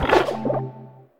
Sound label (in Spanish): Grito de Oinkologne